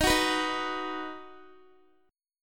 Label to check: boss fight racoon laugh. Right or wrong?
Wrong